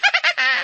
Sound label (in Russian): hihikane visokoe korotkoe